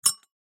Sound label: Call Bell Tap Style